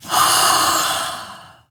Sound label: Med Sigh, Mother, Vocalizations; Warframe; Deimos